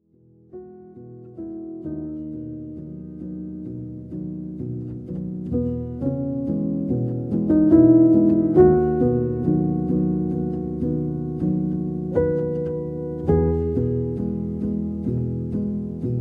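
Klassicheskoe Fortepiano Dlia Sna, classical piano for sleep; Uspokaivaiushchaia Klassiche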